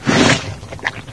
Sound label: bc attack, bullchicken; mistake coop